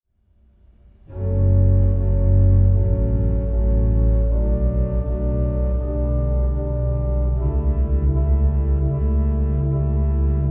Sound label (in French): Prelude de choral Erbarm dich mein o Herre Gott BWV 721